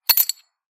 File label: heavy coin drop; soundscrate